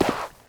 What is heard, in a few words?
sand, footsteps; player